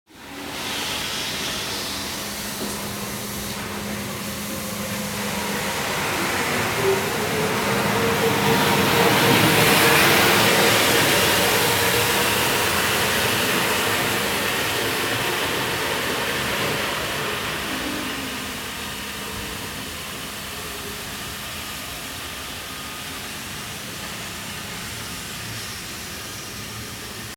Reinigungsmaschine

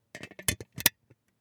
Schnellkochtopf schliessen

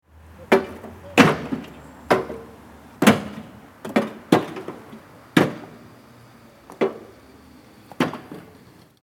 Steine aufladen schnell

